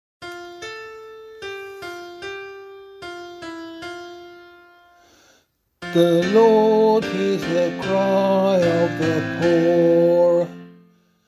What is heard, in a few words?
Psalm C vocal